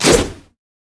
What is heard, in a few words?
wolfman crit attack; LoL; warwick